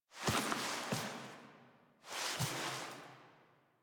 Foley; Warframe; Quests; Zariman; Epilogue

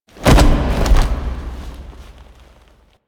Foley Body Fall; Cinematics; Operator; Warframe; Orbiter Cradle

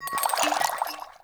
Vial Foley

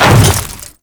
Hammer Boss Step Ice, Footsteps

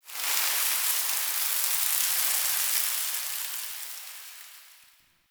Sand Fall; Warframe; Man In The Wall

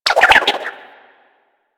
Grapple Rope By

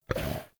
Wolf Land Heavy, Vocals; Animals; Actions

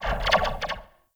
Zipline Random Slow; Gadgets; Parkour Zipline

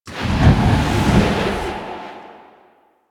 Balloon Move Start; Warframe; Quests; The New War; Vehicles; Unum Tower Balloon

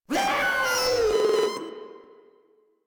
Proto Infested player spotted; Warframe; InfestedNinetyNine

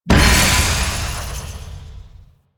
Death Explosion

